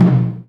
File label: MED DRUM, JAPANESE PERCUSSION; AKAI S6000 CD-ROM - Volume 5; Ethic